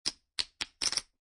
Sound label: Poker Chips Stacking